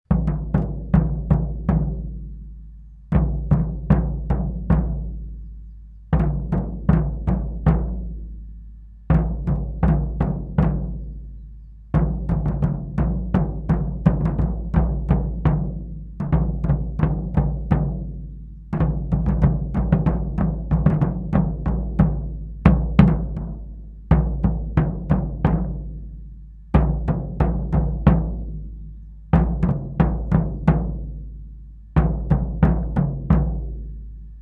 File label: Drums Civil War March; ultimate general gettysburg gamerip 2014